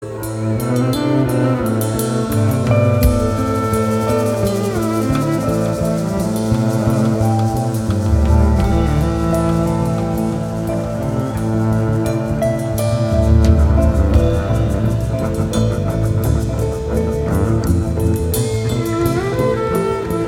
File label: Cello Jam